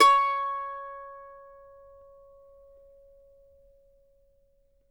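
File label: C#5, Mandolin; Sampleheads; Whole Lotta Country 1 & 2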